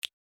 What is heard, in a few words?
send message